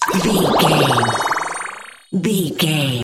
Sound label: water bubble spell heal